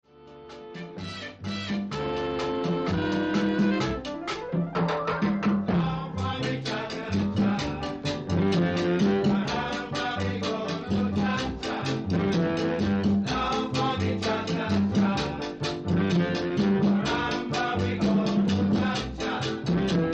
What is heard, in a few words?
African Jazz Cha Cha